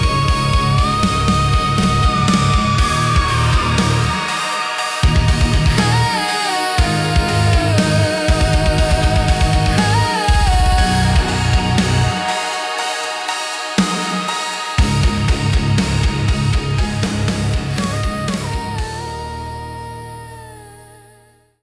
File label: Melodic Metal Version